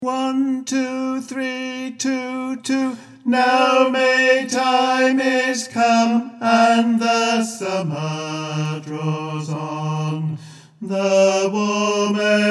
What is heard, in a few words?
Ten Bass learning; Maytime is Come